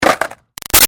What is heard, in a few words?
Skateboard Hit Landing; Skateboarding; Sports